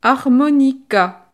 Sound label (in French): harmonica